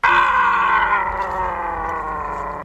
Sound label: "ah" long; ooh ahh